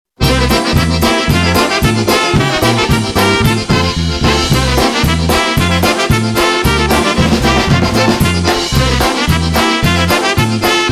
Steel Factory Polka

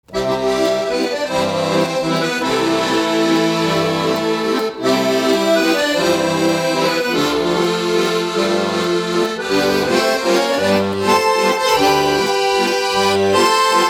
Accordion Man Waltz

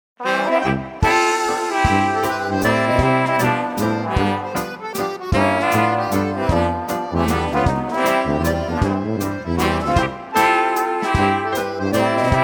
Waltz

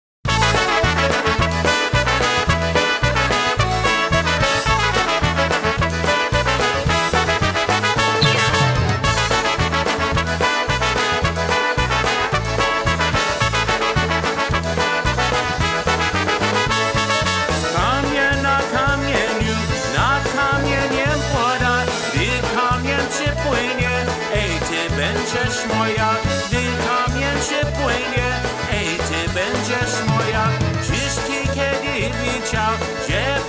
Polka Medley

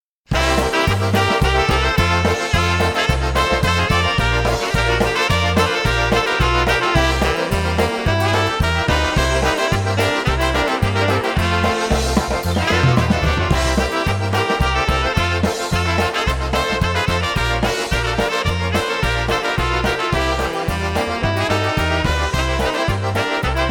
Polka Medley

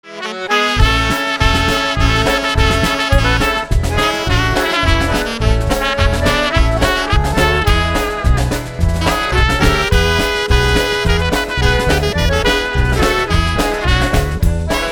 Polka

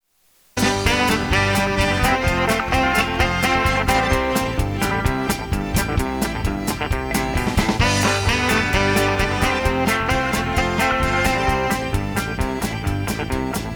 Squeeze Box

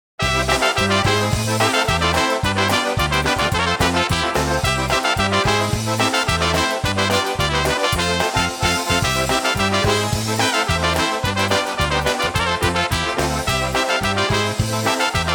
Polka

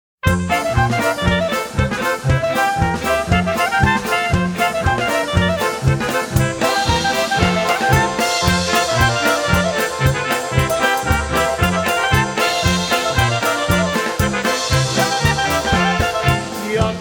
Polka

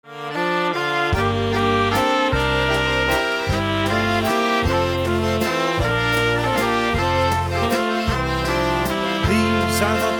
Waltz

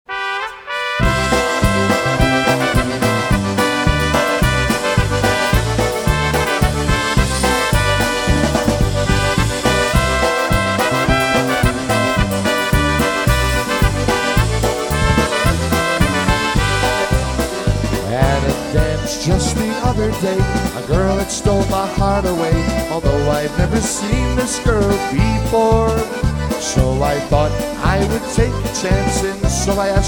Wrong Number Polka Live Jam

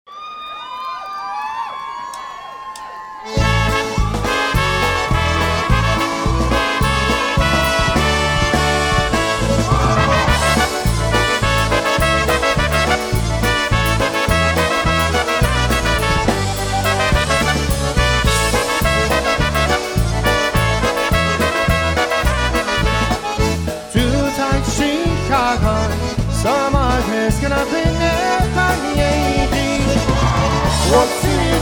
Polka, Live Jam

